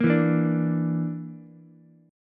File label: D Suspended 2nd Sharp 5th D Ukulele; 3,4,6,5; strummed